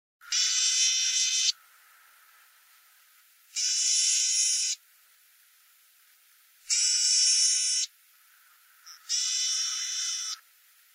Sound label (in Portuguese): Canto do pintarroxo trombeteiro